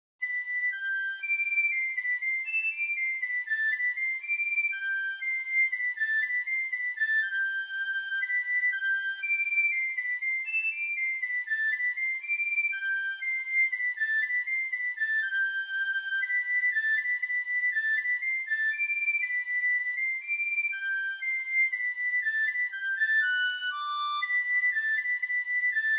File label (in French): marche duc savoie